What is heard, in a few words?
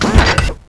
jump